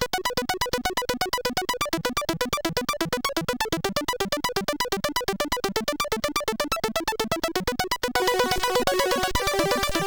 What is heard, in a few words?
sid CSG8580R5; Sharon Style